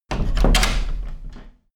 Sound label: closing door